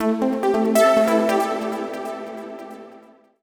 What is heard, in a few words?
TECHNO SYNTH 140; Keys